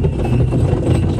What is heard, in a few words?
minecart base; minecraft; PixelPerfectionCE